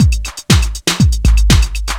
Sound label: LOOP; SoundFactory; DRUMLOOP